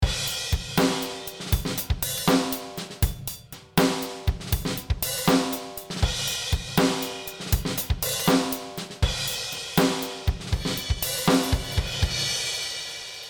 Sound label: Drum; Neutron